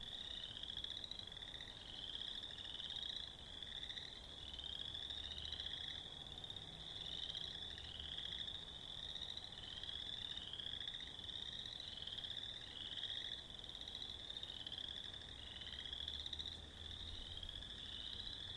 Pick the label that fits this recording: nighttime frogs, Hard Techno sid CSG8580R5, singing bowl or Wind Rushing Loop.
nighttime frogs